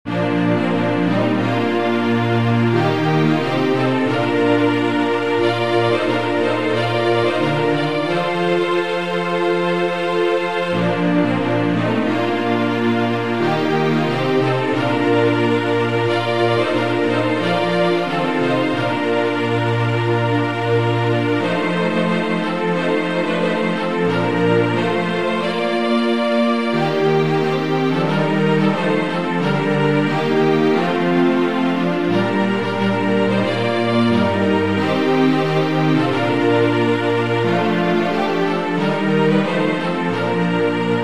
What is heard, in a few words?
strings